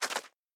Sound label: Footsteps Bug Dirt